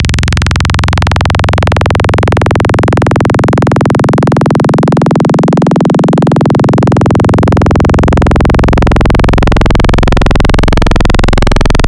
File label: Growl, Wavetables